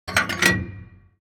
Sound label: gun reload; World of Tanks